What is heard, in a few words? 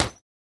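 Short Boxing Bag Hit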